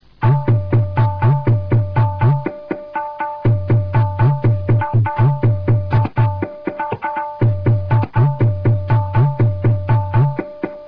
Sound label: tabla; Inde